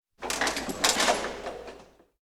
stadium turnstiles

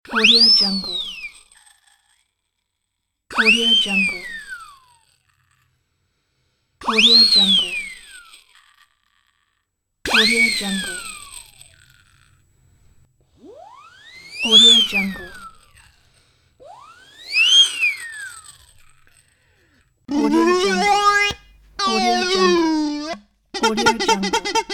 cartoon comic rare pack

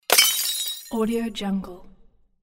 bottle glass break smash; tibasfx

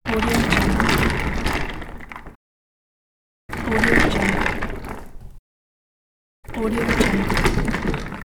rolling

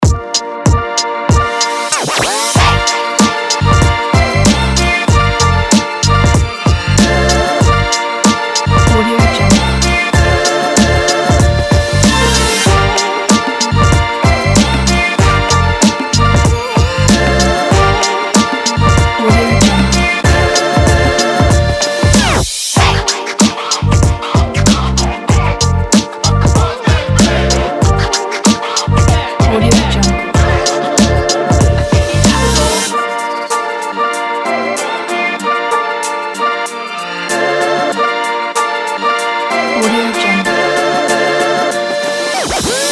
hiphop

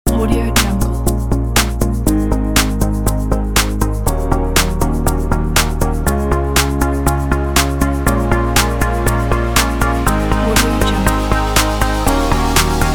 the house music

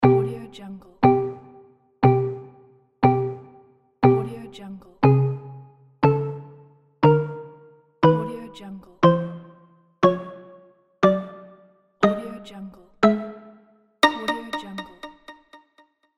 quiz timer

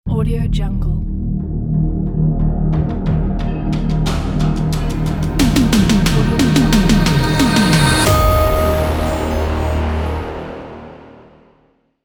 retro movie blockbuster logo

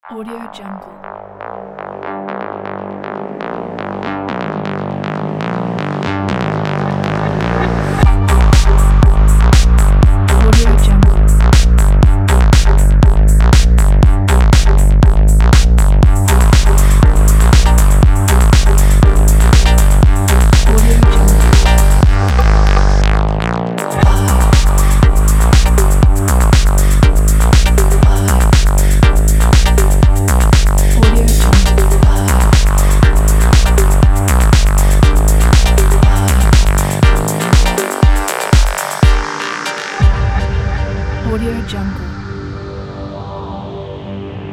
techno; berrypie

